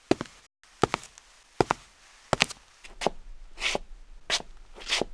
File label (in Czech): kolem auta; Mafie 1 cz